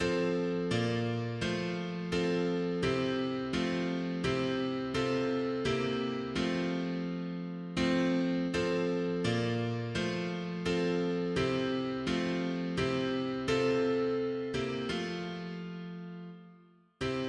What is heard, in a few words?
mono piano, hymns; C est dans les cieux